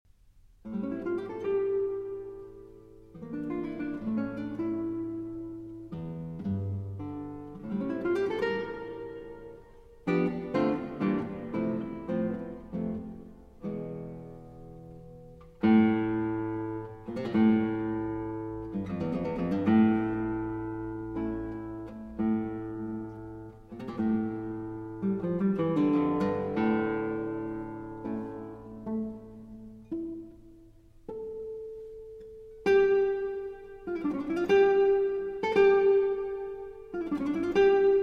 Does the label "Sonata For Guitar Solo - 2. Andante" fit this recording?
Yes